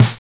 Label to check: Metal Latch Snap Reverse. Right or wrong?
Wrong